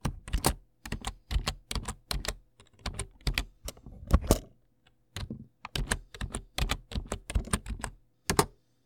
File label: Slow Typing